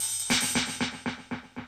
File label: End Percussion